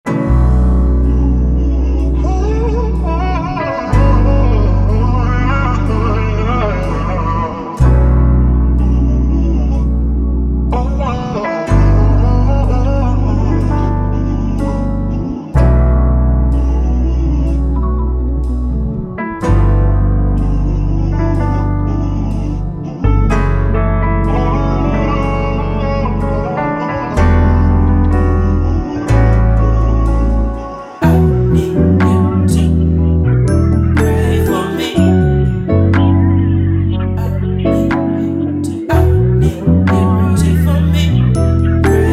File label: sunday soul gospel r b; kato on the track